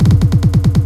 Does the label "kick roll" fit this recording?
Yes